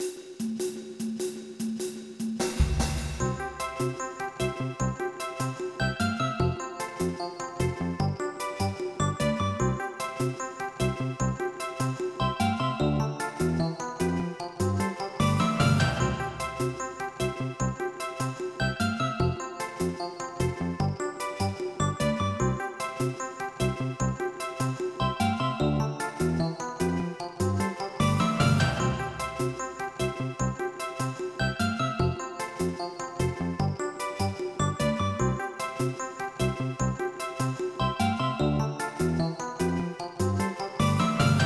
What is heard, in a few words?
Magical Forest Waltz; Dokapon the World